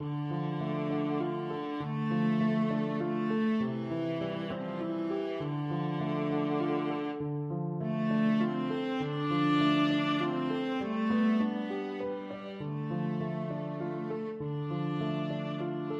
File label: viola